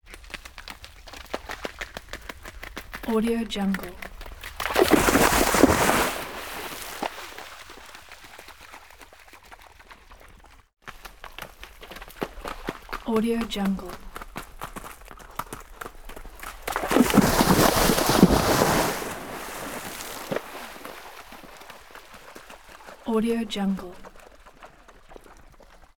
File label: water splash wet smacking footsteps